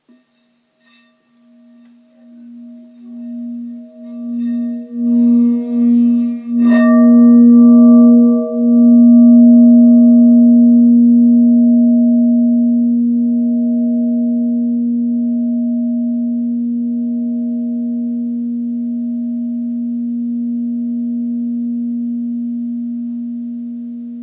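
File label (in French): malachite LA dièse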